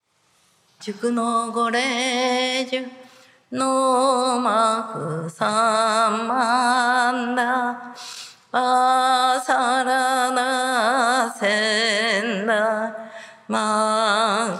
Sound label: Chant; Her Holiness; Goreiju; Shinnyo